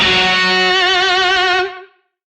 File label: Shawzin F Sharp; Oct Two; Music; DE; Warframe; Lizzie Shawzin